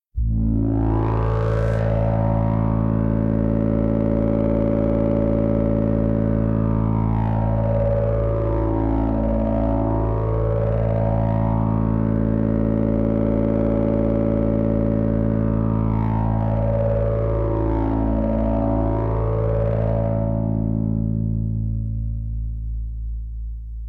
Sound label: Syntetic lowpass; KMP Film Video Sound Effects